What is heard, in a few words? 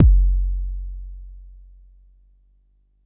Bassdrum; Jomox Xbase09